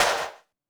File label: 999 Clap, drum kit; Concrete Collage Drum Kit; Concrete-999